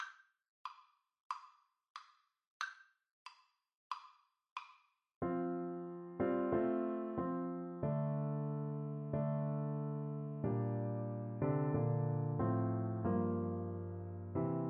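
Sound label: recorder karaoke; bleak midwinter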